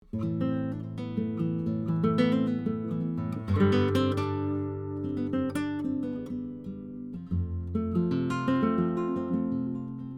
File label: Flamenco; Improv